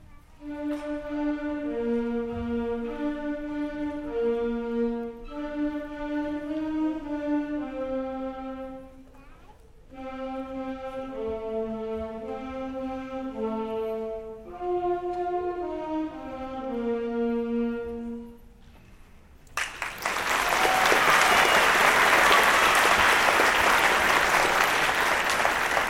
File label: Saxophone Feature, Beginner Winter Concert; Knight Band